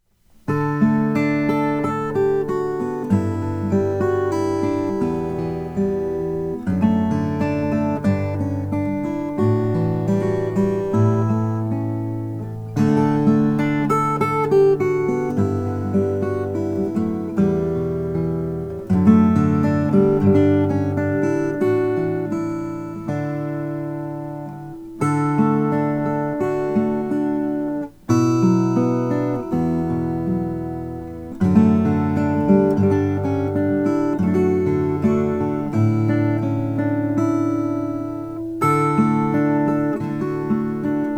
cocobolo guitars

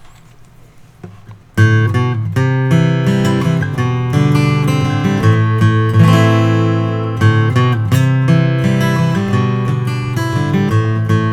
mj franks oms 14 fret; natural

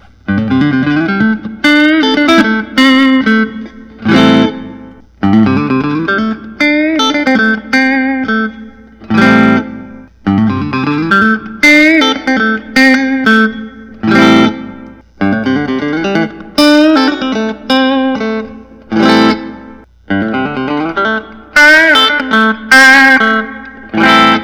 zion model 90 thinline honey burst

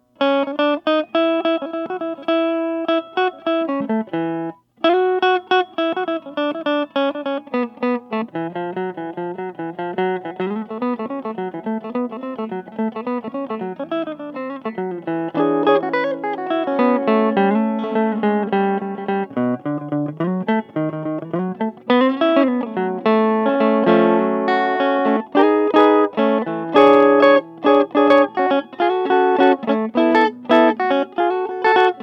andersen model 18 natural blonde; 1991

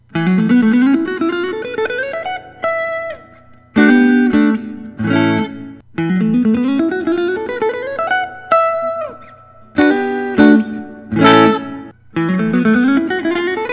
Lentz traditional S Brazil; three tone sunb

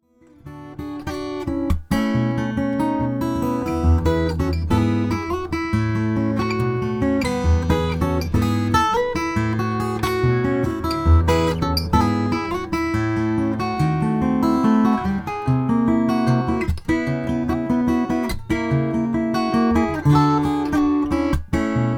buendia om cocobolo

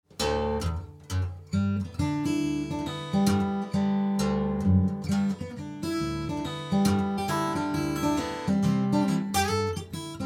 bagnasco casati d 28 12 fret; sunburst

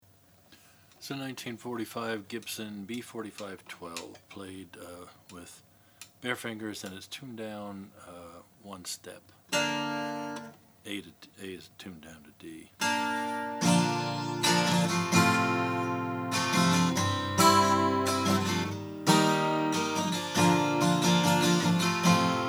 gibson b45 12 cherry sunburst

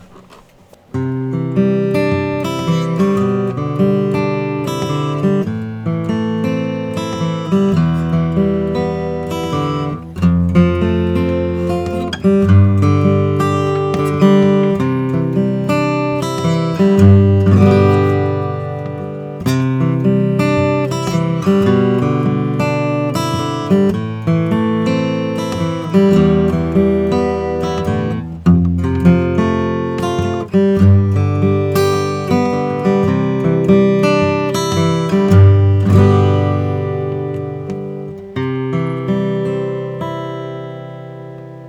Gibson custom stage deluxe 12 vintage

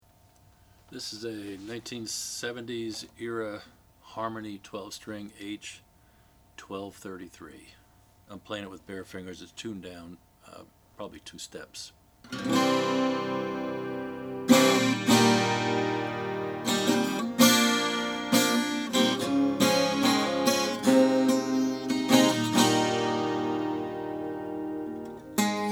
harmony h1233 12 string natural; 1970

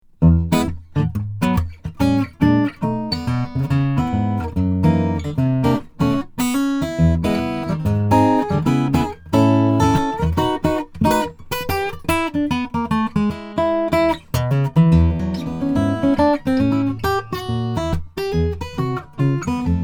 brondel d 1 brazilian rosewood

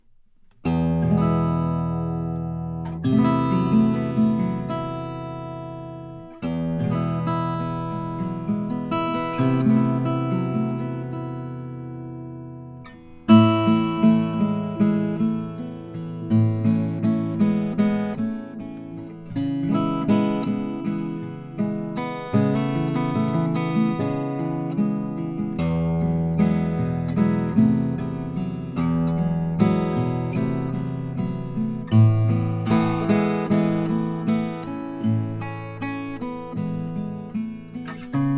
treehouse omz cocobolo

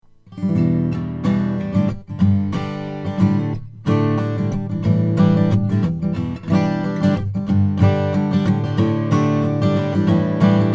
froggy bottom k deluxe koa

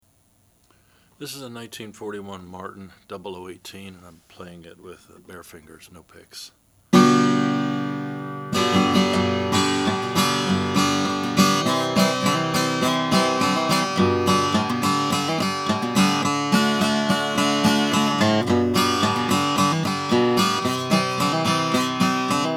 martin 00 18 natural

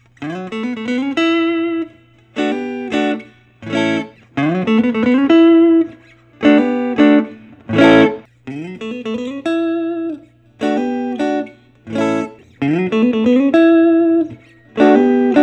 studio soft top goldmine

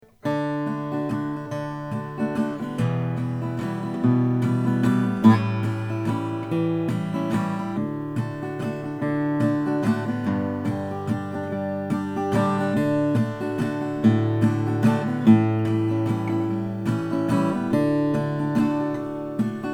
Gibson LG 2 sunburst; 1943

